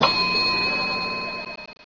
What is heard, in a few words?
catch tossup